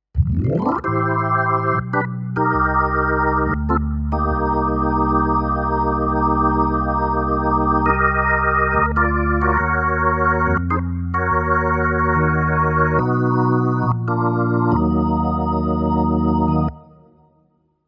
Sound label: hammond